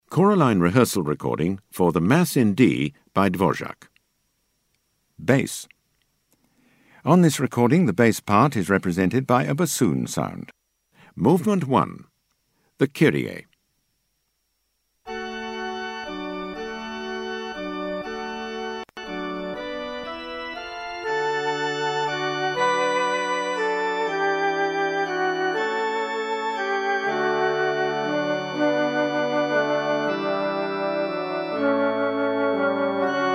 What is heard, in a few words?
Bass; Dvorak D&Te